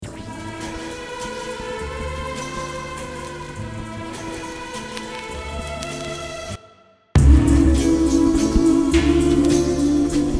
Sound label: waltz